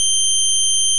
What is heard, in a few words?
timevarying low blit; dafx12 nonlinear phase oscillators